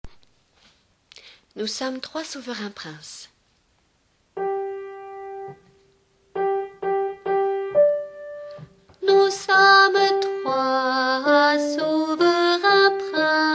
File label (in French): nous sommes 3 souverains, basses